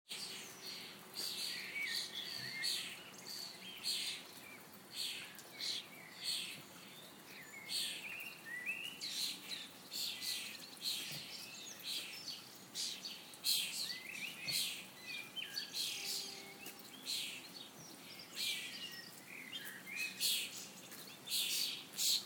morning rain with raucous starlings and melodious blackbirds